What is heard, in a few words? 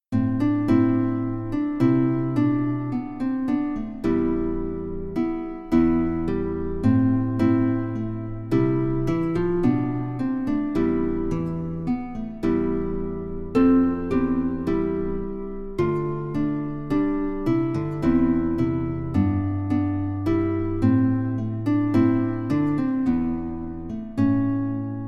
guitar